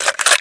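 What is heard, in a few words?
pickup, weapons; SINDEMO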